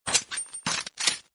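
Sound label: USP reload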